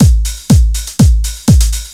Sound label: LOOP; SoundFactory; DRUMLOOP